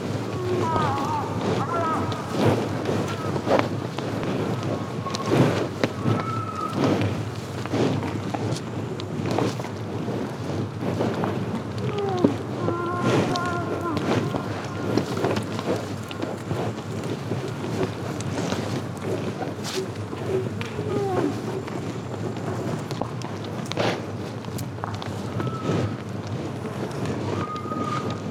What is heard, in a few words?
Deer Herd; The First Tree linux macos windows gamerip 2017